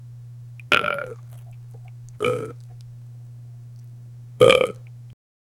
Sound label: man is pooping, bathroom